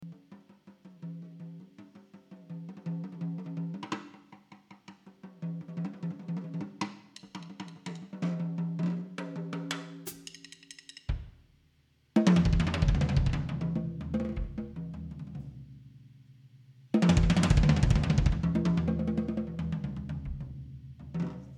Drums

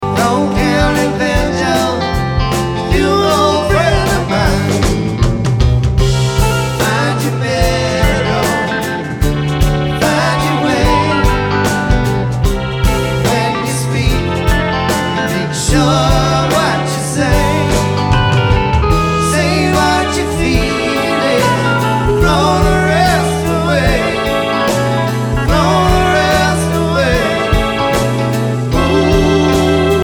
Banjo

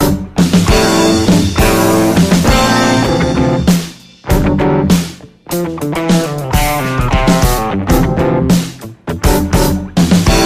instrumental

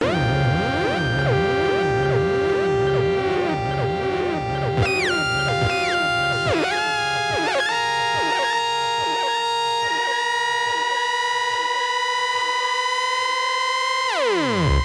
EWI 4000

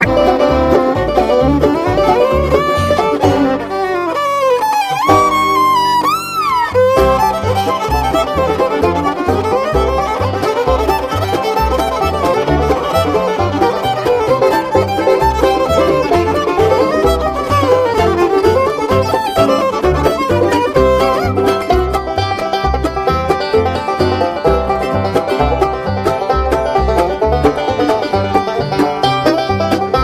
Fiddle Rag

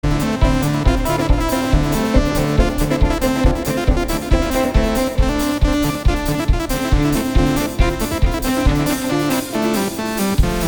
Drum n bass

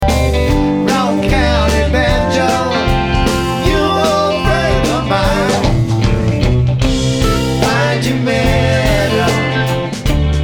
Banjo

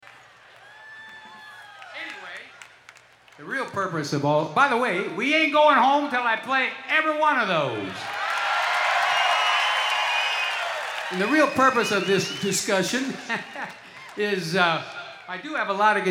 Guitar Moment

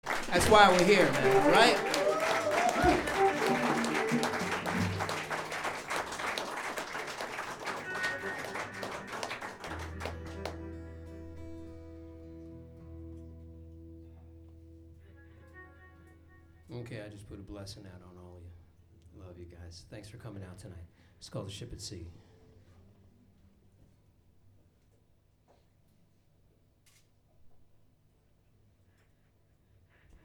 Crowd

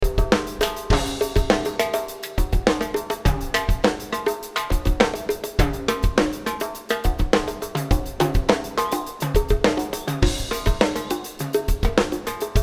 Drums

